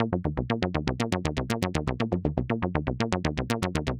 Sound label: SH101 Arp (120BPM), retro house